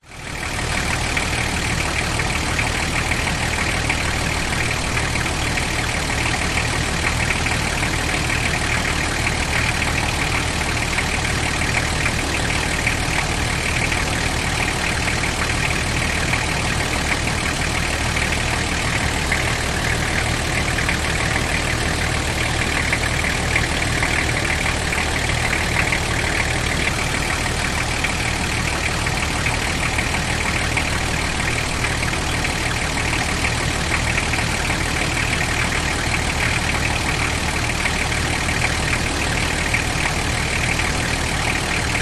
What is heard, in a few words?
car suv nissan patrol diesel idle underneath front ext; vehicles